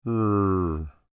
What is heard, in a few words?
Creature Speak Groan Short, Human; Groaning; Voice; Big Room Sound